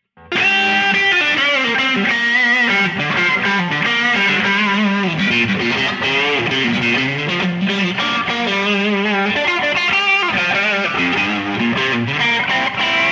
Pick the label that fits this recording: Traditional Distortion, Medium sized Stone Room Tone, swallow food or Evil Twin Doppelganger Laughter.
Traditional Distortion